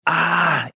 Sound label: ahhhh